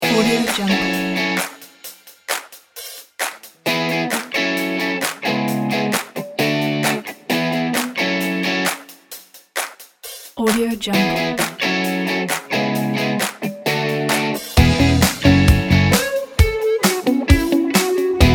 positive funky

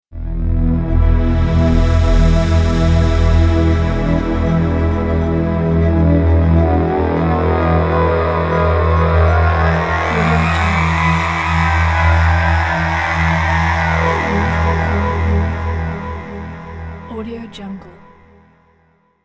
buttery swirls ident

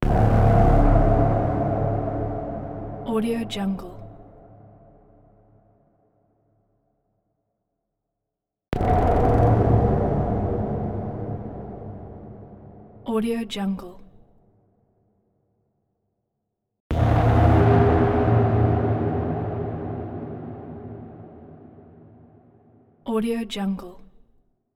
bloody nightmare tones tonal hits; soundmorph

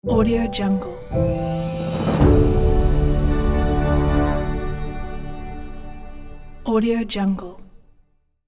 medical logo

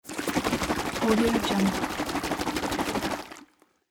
hot water bag fast shake

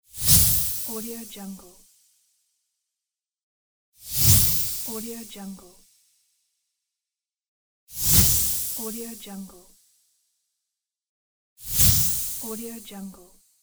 short shine; gamechestaudio

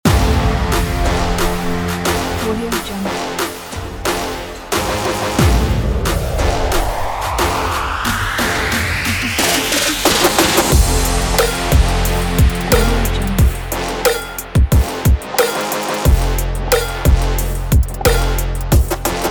workout; marblespace

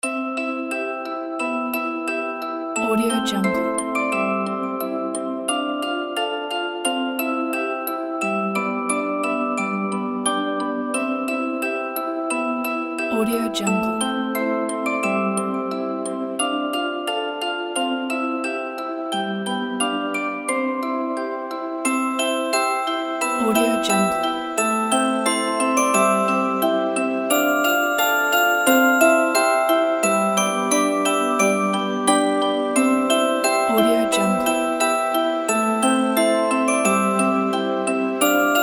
christmas jingle bells lullaby

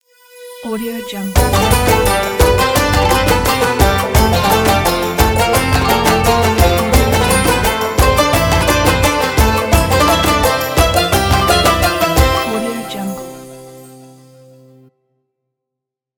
ramadan arabic logo

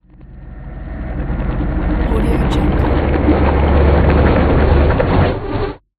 alien time warp

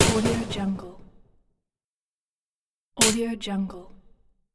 user interface mechanical selection

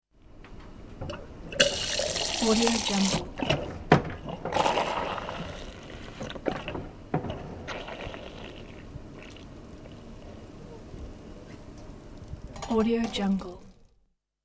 pint of beer being pulled in a bar or pub

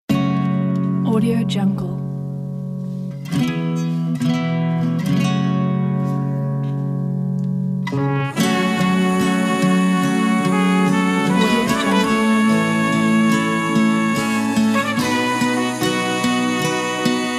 spaghetti western

